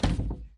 Cupboard Open

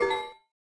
Tips HUD; Lethal Company

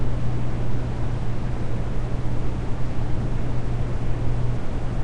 big machine room

